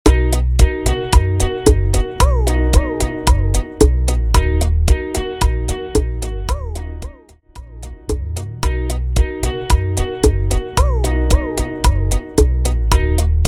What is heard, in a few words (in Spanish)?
Bachata 112 bpm